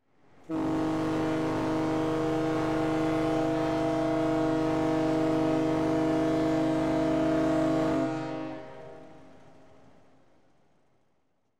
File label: Ship, CAR Ferry, 17,400 Tons; Transportation; BBC Sound Effects Library